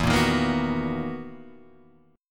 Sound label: B Major 7th E; BM7/E Chord {0 1 1 3 0 2} strummed